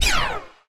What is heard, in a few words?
Shot